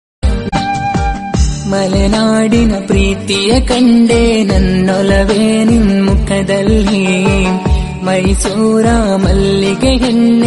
kannada melody

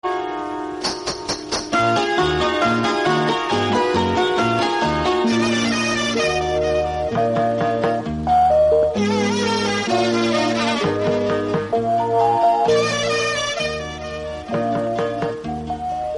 instrumental song

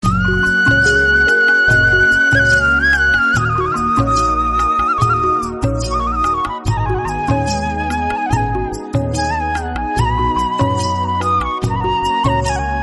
no copyright village background music

